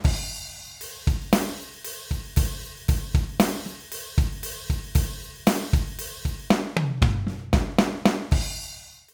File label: drums, Kurzweil K2700